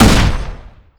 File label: frag bomb launcher shoot; tf2classic